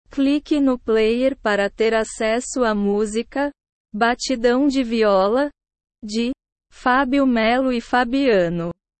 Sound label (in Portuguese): batidão de viola